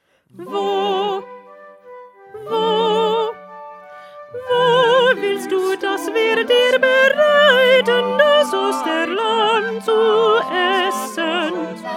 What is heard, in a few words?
Alto; Bach; St Matthew Passion